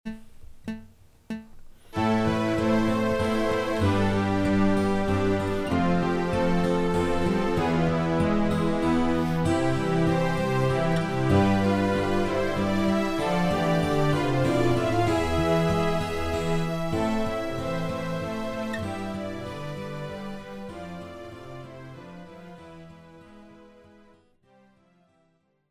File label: Rhythm; Exercise 20